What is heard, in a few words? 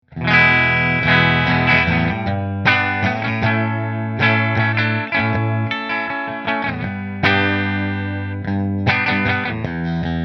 Nola Voodoo Natural Bridge Fender; New Orleans Guitars Voodoo Custom Natural Finish